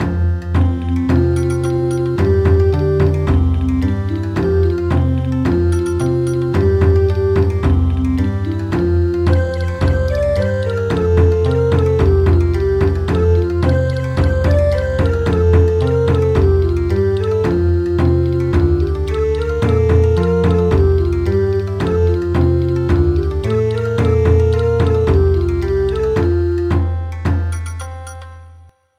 Bon Odori; El Rotlleto

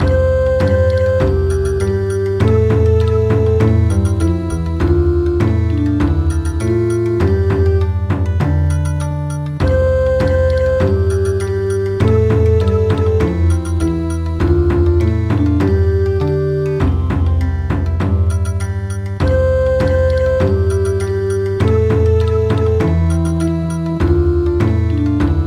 Bon Odori; Bunny

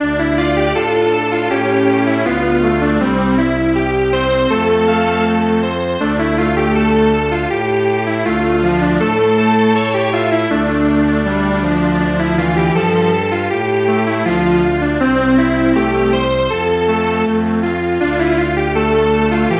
Strings Quartet

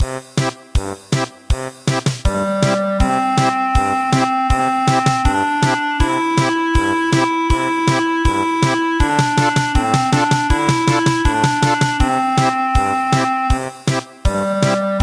German Polka, midi

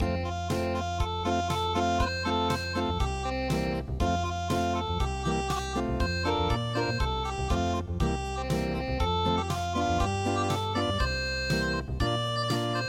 puff puff train

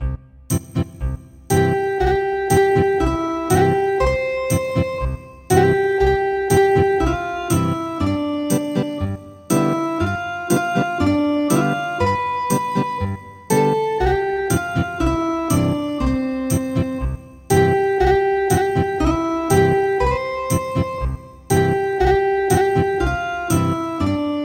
Ethnic Guitar; Clap hands with the ladybugs

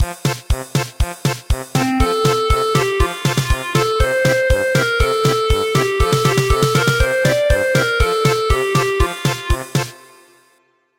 German Polka

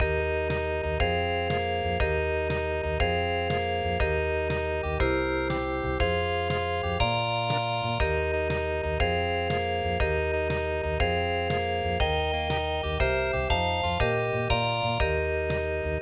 Paris Musette

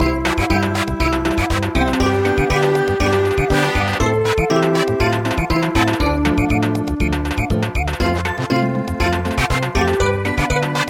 Samba

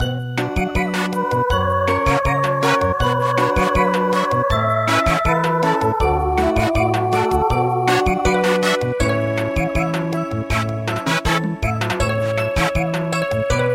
Samba; Autumn Mountain Song

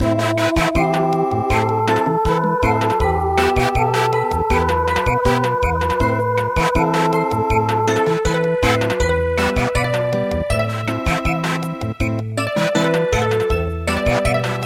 Samba; Choshi Tairyou Bushi

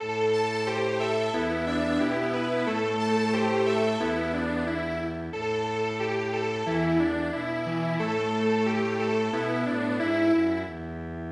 Strings Quartet

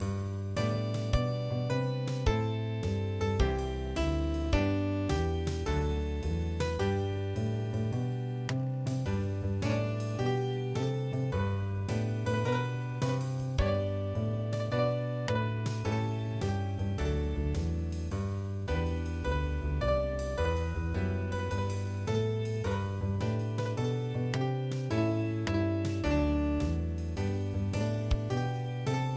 piano trio; chatsumi

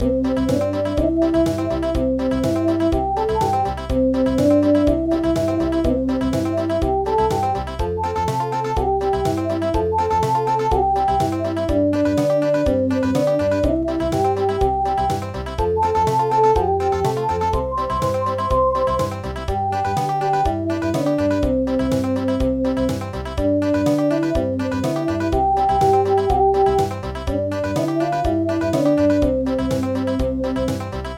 Country; Pondan Ponda

